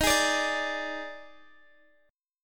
D sharp Major 7th Suspended 4th, Guitar, Open D; chordshape

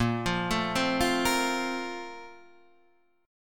A sharp Major 7th Suspended 2nd

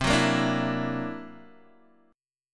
C 9th Flat 5th, Guitar DADGAD, chord

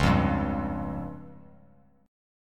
C sharp Major Flat 5th, Bass Standard; C#Mb5 strummed; JGuitar

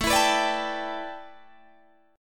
A 7th Suspended 2nd Suspended 4th Guitar Open G

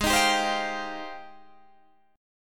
Ab Major 13th, Guitar, Open G

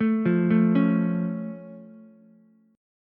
C sharp Augmented down Ukulele, chord; arpeggiated; JGuitar

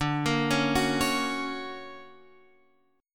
D Augmented Major 7th Guitar Open F, chord; x,9,10,8,9,8; arpeggiated; JGuitar

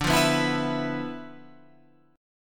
D Minor 6th Add 9th D, Guitar, Open F; Dm6add9 strummed; JGuitar

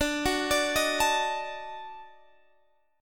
D sharp Minor Major 7th Flat 5th D

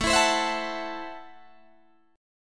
A Minor 6th A Guitar DADGAD

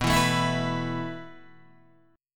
B 7th Suspended 4th B down Guitar Open D

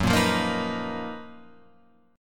Gb Major 13th Guitar Open F; GbM13 strummed; JGuitar

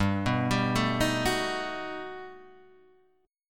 G Augmented Major 9th, Guitar, Standard; G+M9 arpeggiated; JGuitar

